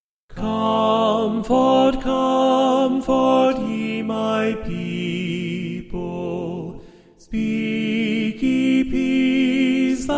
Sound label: comfort tenor